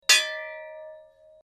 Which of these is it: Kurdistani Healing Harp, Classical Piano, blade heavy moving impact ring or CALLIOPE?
blade heavy moving impact ring